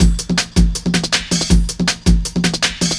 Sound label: Desire Break, Jungle Breaks; M8